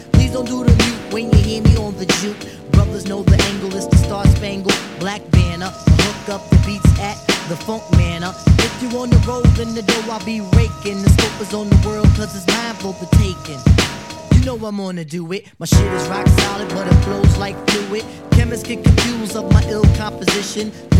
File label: clap